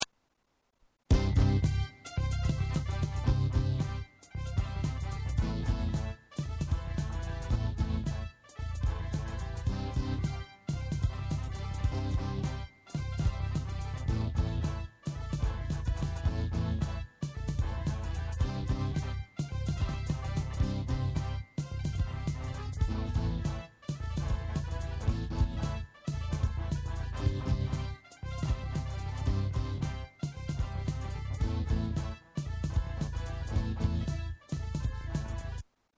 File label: Trumpet Screamer